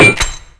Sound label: hit, hook; predator